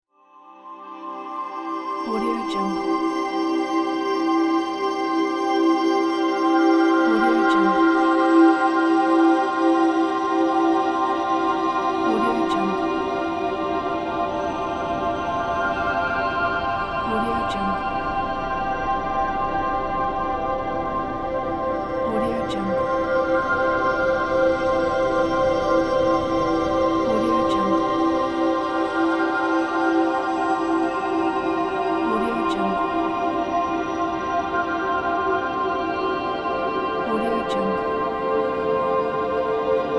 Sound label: heaven ambience